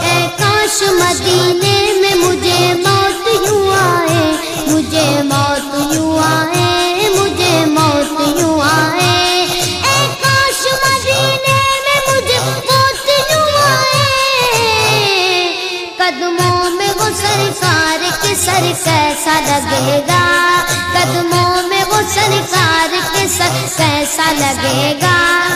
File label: Islamic Ringtone